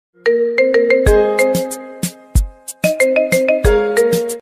SMS ringtone for girls

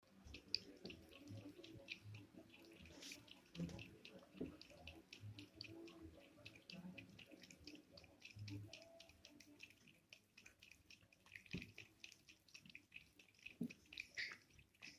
cat drinking water; آب خوردن گربه

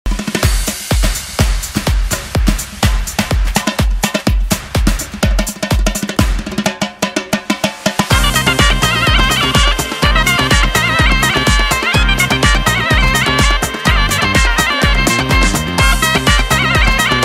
Zurna

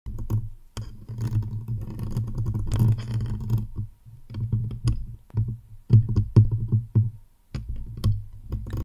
microphone asmr; ضربه زدن به میکروفون; اسمر

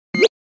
Digital button

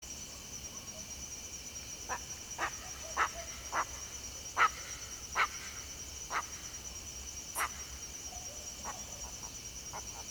Western cattle egret; پرنده گاوچرانک

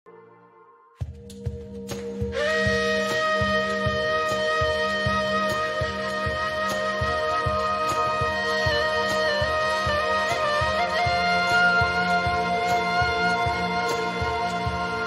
Turkish flute; فلوت ترکیه ای

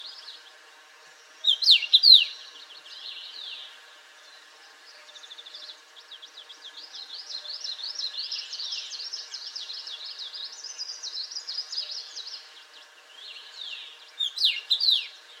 Common Rosefinch; پرنده توت خورک

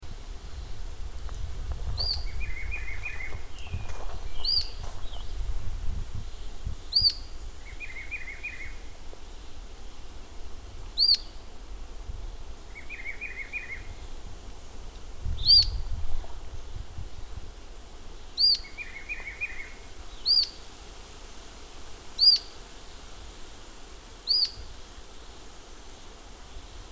Rough legged tyrannulet

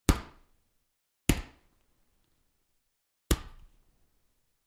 Volleyball Spikes Sound; والیبال

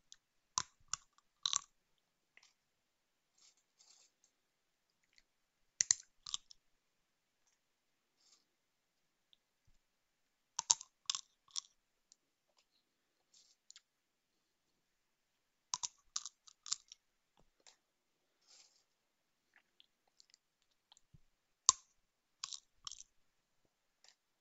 Eating seeds

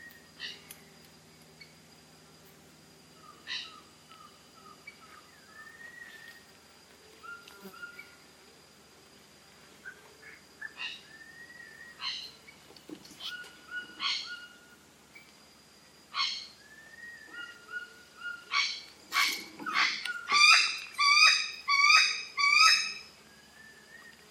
Red necked aracari

